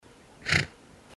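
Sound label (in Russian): jyraf fyrknul, giraffe snorted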